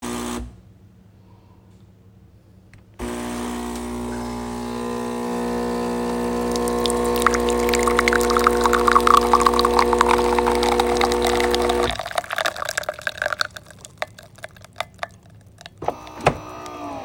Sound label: coffee machine